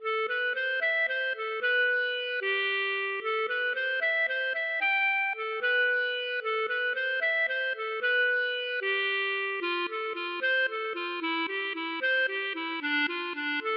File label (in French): valse